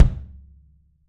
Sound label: axis kick, drums; 3D Sound